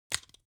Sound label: object spice jar small close rattle; smartsoundfx